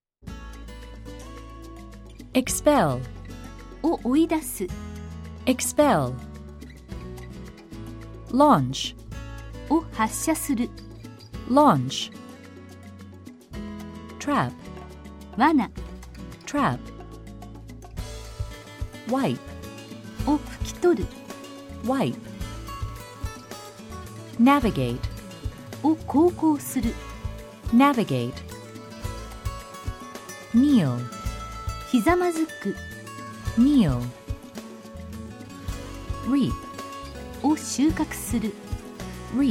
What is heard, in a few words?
tango